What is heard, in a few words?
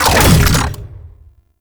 Shield Start; Vehicles; Abilities; Thano Mech; Warframe; Nechro Mech